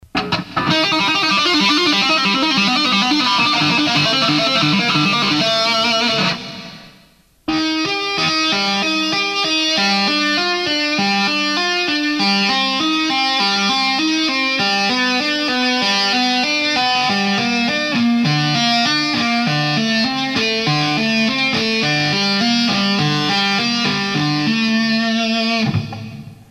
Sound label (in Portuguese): guitarra heavy metal habilidade mecanica